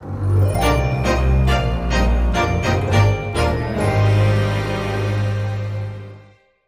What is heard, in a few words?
jingle